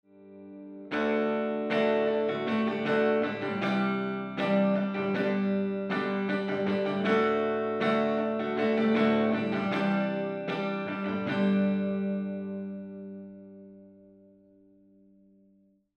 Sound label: CLOSE HALLWAY; ROYER; 414